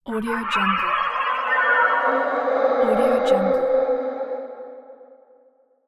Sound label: ghostly background